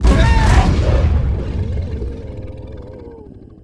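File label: death, charger; giants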